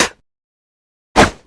attack; blackquill

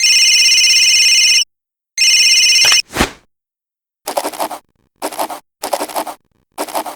phone calls

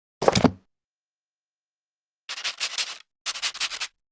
write; garan

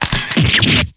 damage

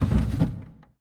drawer

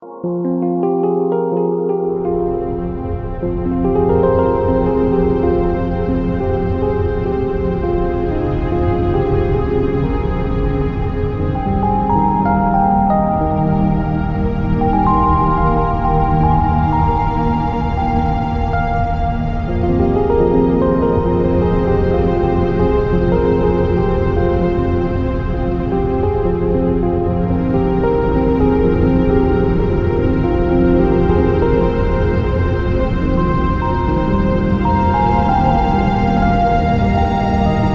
Forest

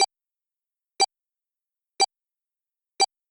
dead blip

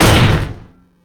slam

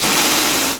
blow

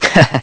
Laugh; Neku

